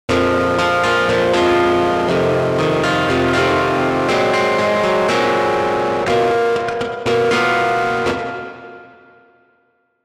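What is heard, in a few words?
Electric guitar riff no EQ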